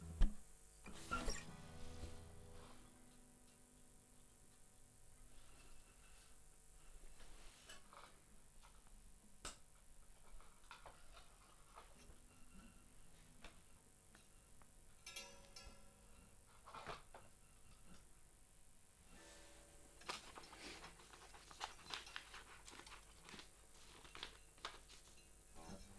Nebengeraeusche

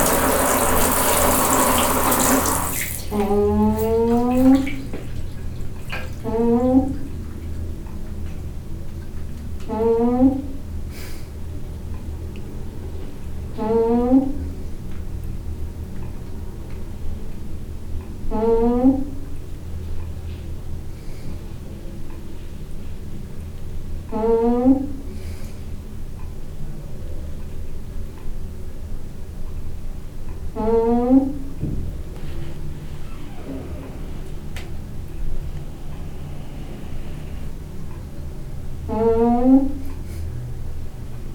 duschschlauch; Fieldrecordings; Brasilien; bertonis

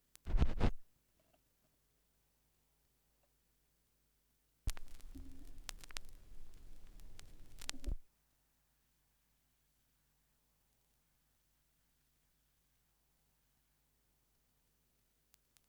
schallplattengeraeusche

